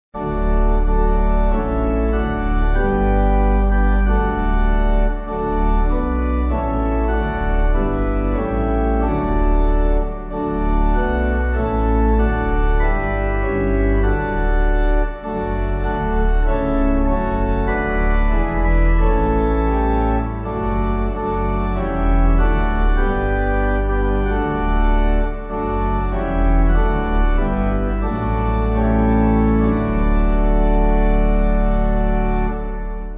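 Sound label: D Pipe, pipe organ; Go To Dark Gethsemane; Redhead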